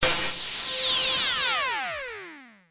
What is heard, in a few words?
Cwejman S1 - CV FM MOD